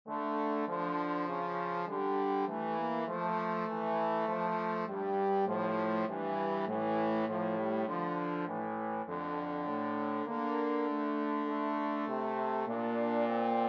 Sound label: bach aria, trombone duet